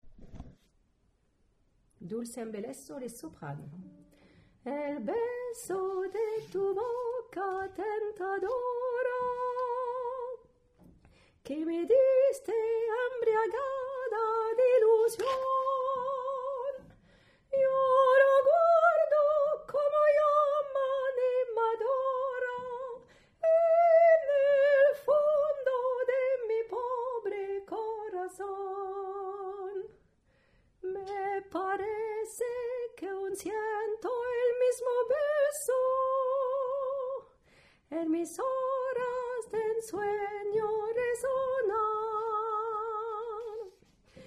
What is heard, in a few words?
Soprano